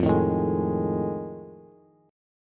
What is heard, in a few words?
F 7th Sharp 9th, Bass; F7#9 strummed